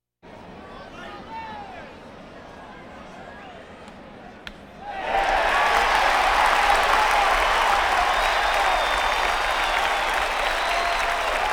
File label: Crowds Race cars Baseball